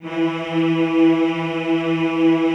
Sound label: E2, Classical Choir; Best Service; Extended Classical Choir